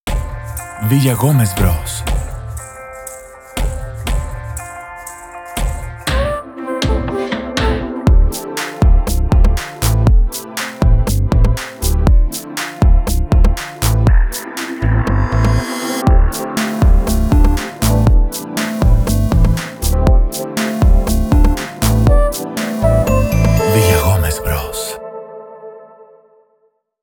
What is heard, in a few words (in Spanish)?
jingle para cortinilla